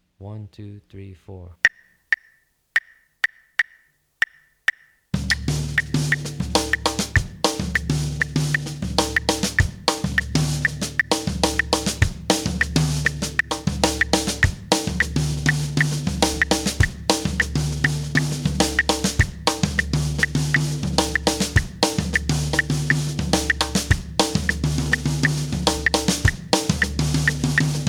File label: guaguanco variations